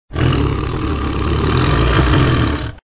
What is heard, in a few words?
tiger sound